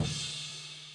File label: Floor